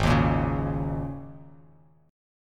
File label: Ab Suspended 2nd Suspended 4th Ab down Bass Standard; strummed; JGuitar